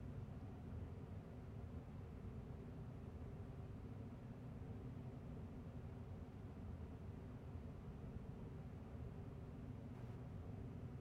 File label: amb loop